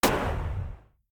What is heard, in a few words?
gunshot; Factorio; Factorio 0.14.21; fight